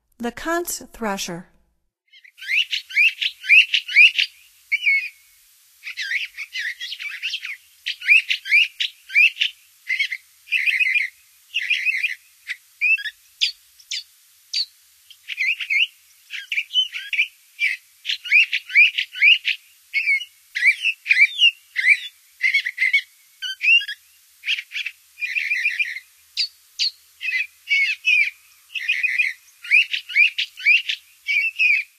Le Contes Thrasher, Songs of Birds of North America; Stokes